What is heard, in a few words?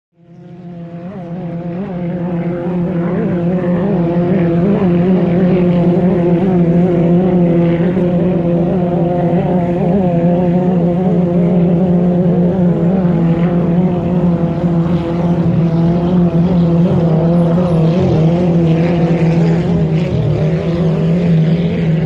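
Inboard Outboard Boat Bys, motorboats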